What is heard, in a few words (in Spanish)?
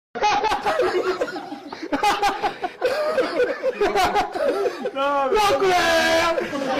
no creo risa